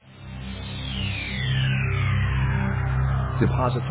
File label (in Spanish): espacial